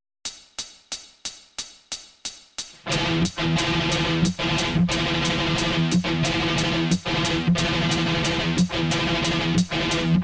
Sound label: thrash mix